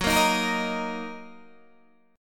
Fsharp 6th down Guitar Open D

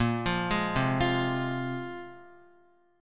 Bb 7th Suspended 2nd Bb, Guitar 4E 4A 5D 5G 5C 6F; Bb7sus2; arpeggiated; x,1,3,1,0,0; JGuitar

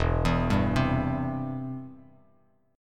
E Minor Sharp 5th E down Bass Standard; Em#5 arpeggiated; JGuitar

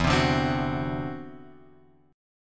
E 7th Suspended 2nd Sharp 5th, chord; E7sus2#5 Chord {0 3 0 X 1 2} strummed

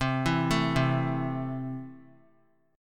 C 6th C down, chord; C6 arpeggiated

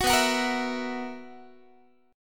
B Major 7th Suspended 4th B down Guitar; strummed; 21,19,21,20,20,x

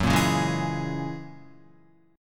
Gb 9th Gb down Guitar 4F,4A,5C,5G,5C,6E; Gb9 strummed; JGuitar

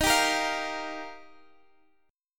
D sharp Major Add 9th, Guitar, Half Step Down; D#add9

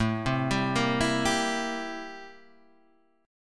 A-Minor 7th Flat 9th, chord; A-7b9 Chord {5 3 5 3 5 3} arpeggiated

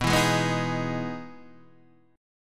E Major 7th B, chord

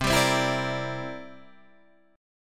C 9th C down Guitar Open D

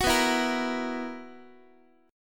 B Major 7th Suspended 4th, B, down, Guitar; BM7sus4; strummed; x,x,9,9,8,9; 4A,4E,5A,5D,5G#,6C#

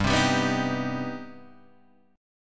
C Minor Major 7th G

